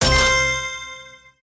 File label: collect end; Beauty Fairy